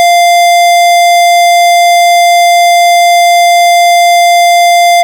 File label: beep